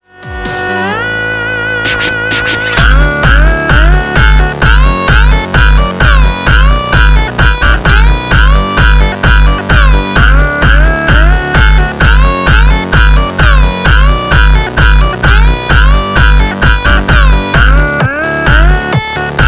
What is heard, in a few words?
music